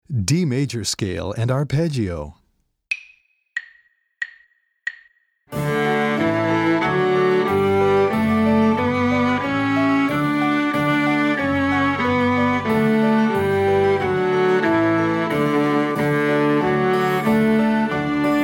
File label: D Major Scale and Arp